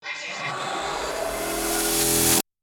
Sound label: sting; close shave